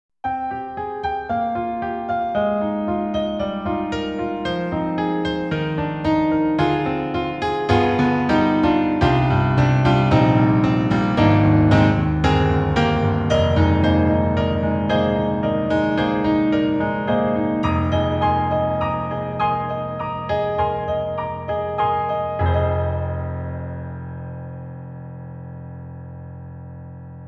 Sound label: piano, EDIROL SD-90; St.Piano 1